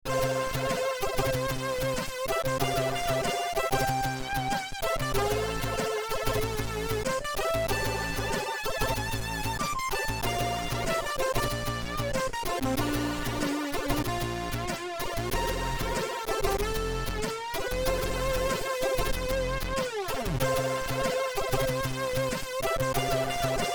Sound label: Drum; sid